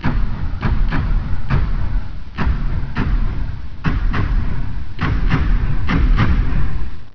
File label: drums; assault